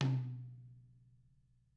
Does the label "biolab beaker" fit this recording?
No